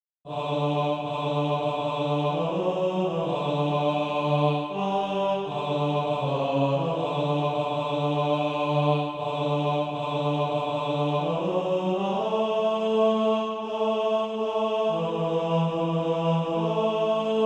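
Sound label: bass; SATB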